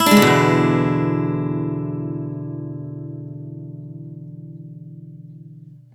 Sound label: E upstroke, Acoustic Guitar; E 7 upstroke; Sonic Foundry (Sony Creative Software); Songwriter Acoustic Guitar Companion; One Shot Chords